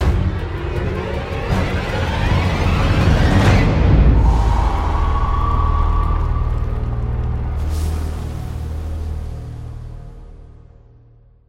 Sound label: stinger; ui; stingers; qop arc